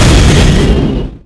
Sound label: shock rifle sphere explosion